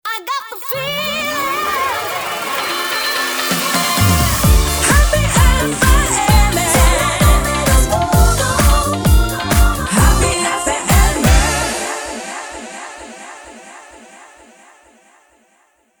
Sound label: Happy Funky